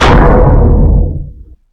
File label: trap wrecking ball nonfatal